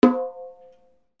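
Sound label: percussion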